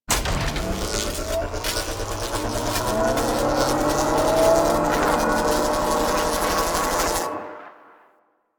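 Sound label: Plains Electric Machine Rock Charge Up; Warframe; Ambience; Eidolon Plains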